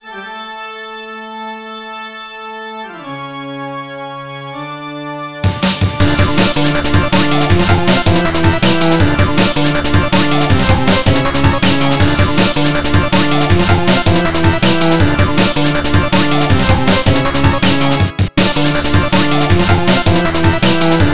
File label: Sees (Instrumental); funkin vs. sussy mussy nussy wussy tussy russy lussy kussy mod